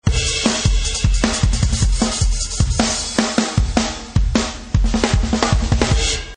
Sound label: Electric purple drum set